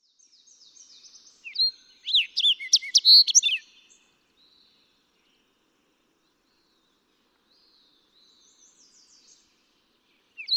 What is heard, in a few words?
Fox Sparrow